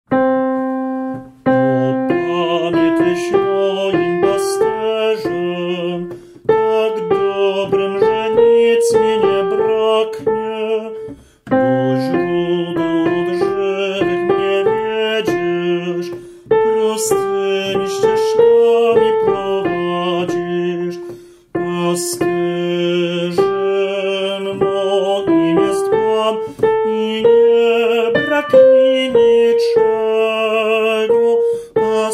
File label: TENOR; O Panie Tys moim Pasterzem